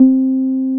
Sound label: TB-303